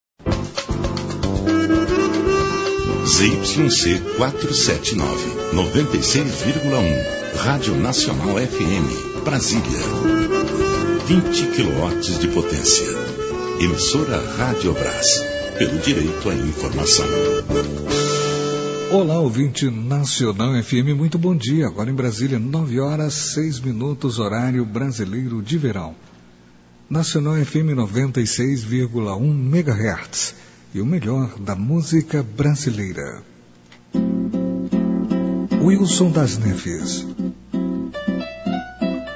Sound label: interval signal; brasilia fm